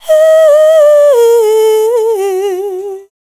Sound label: FEMALE, Dance Vocals